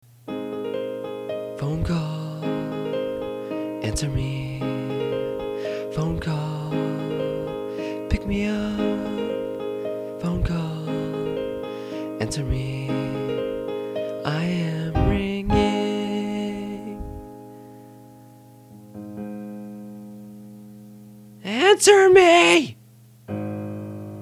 Jolly Phone Jingle

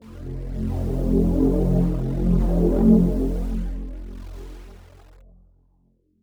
Synth Note Pulsating Reese F

